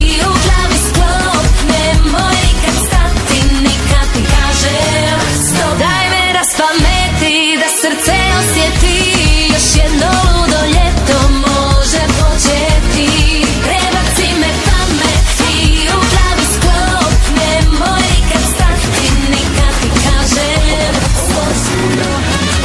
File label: croatian ringtone